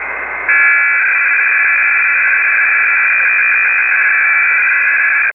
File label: ITALIAN-MIL 600 Bd